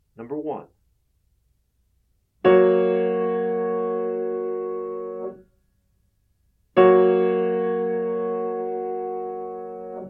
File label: chords